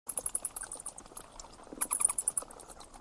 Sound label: netopýr bat